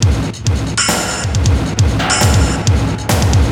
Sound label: Dark Industrial Loop 136bpm